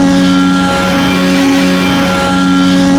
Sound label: EX OFF VERY HIGH, F1; AOS F1 2022; FSR2018